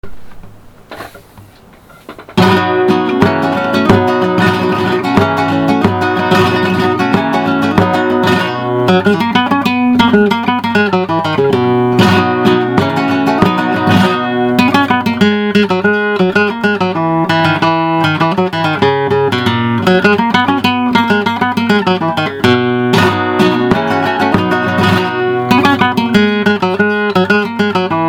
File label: Sevillanas